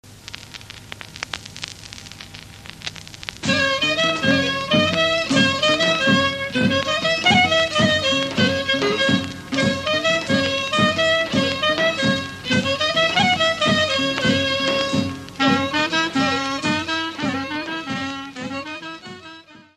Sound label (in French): Zensko Berance, Macedoine